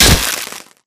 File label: imp bullet generic headshot android; Titanfall 2